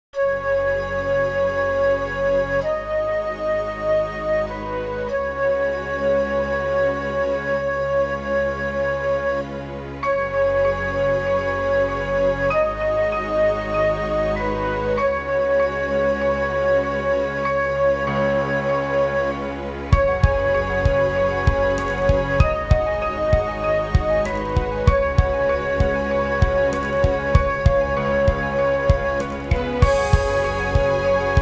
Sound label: RPG forest; Dungeon